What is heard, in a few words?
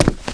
Footstep Run Base